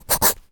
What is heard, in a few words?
Pencil Check Mark